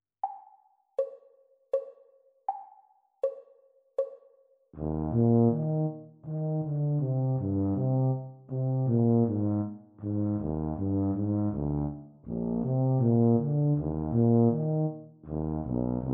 Eb Tuba Duet exam speed; Grade 8

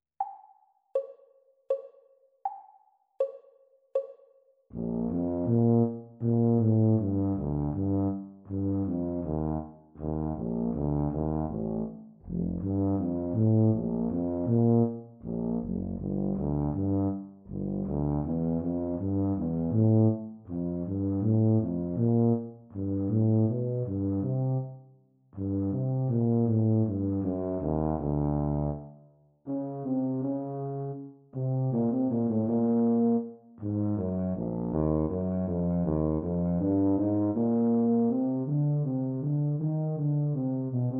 Bb tuba duet exam speed; Grade 8

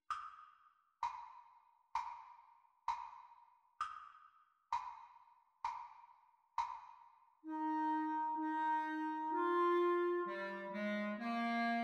Practice speed Recording Clarinet Duets

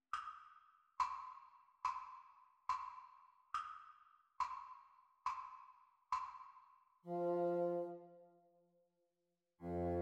bassoon duets practise speed

